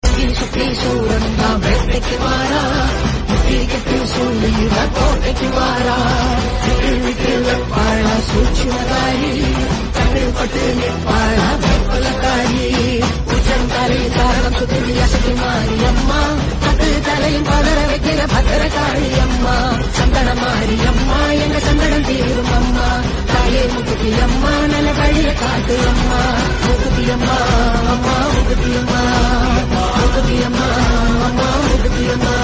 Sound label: Tamil Ringtone; Mookuthi Amman